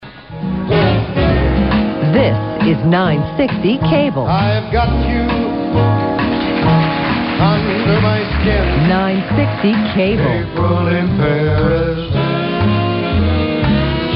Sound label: radio jingle stereo; kabl; AM stereo